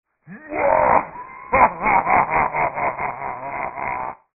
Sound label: shark sound